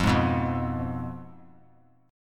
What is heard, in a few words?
F Diminished; Fdim strummed; JGuitar